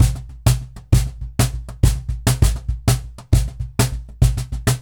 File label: Cajon Loop 100BPM; musicradar